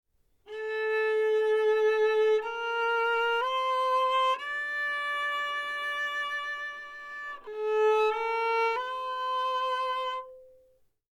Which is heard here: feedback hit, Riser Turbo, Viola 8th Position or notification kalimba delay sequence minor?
Viola 8th Position